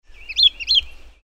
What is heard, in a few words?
whinchat